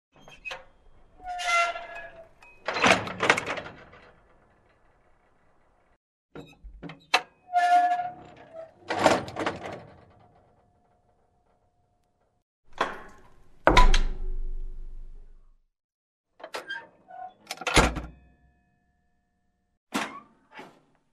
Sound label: Opening, closing the hatch of a submarine